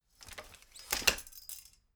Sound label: folding chair fold fast